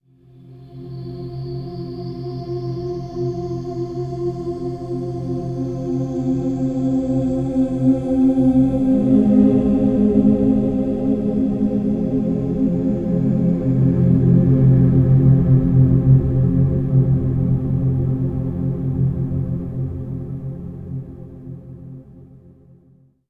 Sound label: Low Complex Voice, Complex Wave; Sonic Foundry (Sony Creative Software) - James Johnson Spektral Minimalism